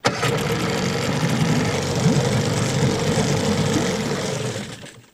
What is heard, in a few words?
50 Hp Motorboat Strt I